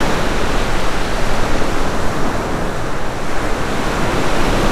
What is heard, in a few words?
ocean loop